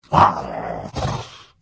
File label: Hit; The Elder Scrolls III - Morrowind